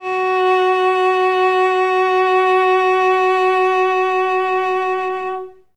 cello solo F#3; Best Service ProSamples vol.51 - Classic Orchestra 2

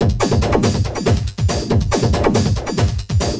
Beat, Industrial; Sonic Foundry (Sony Creative Software)

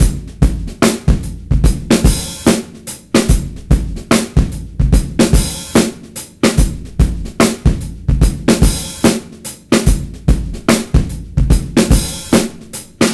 73 bpm, Breaks; 90 s Sample CDs; Planet of the Breaks

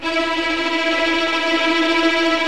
TREM VIOLINS

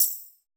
SYN TAMB; FILM KIT; Drums In Your FACE!

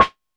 hi-percussion, techno; Techno ID; single-drums

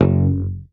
Hamer mute F#1, bass; Sampleheads; Will Lee Bass Library; HAMER12

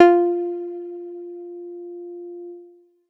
lead mild F; leads

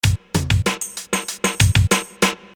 Beat, Hip-Hop Street Beats

